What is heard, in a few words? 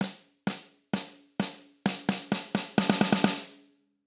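Maple, drums; electronic; 14x5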